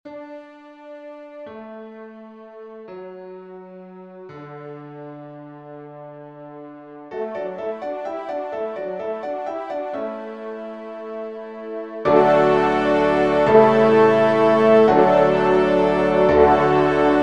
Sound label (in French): trompe piano; Le Reveil de la Lorraine; accpt piano